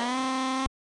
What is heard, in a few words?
Glide Synth